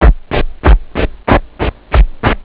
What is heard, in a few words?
rave fill, loop techno